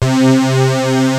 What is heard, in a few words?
SAW, ROLAND D - 70; Rubber Chicken Software Sample Bank